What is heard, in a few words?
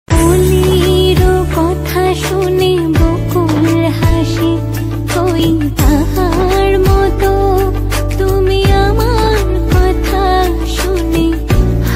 Female version romantic bengali ringtone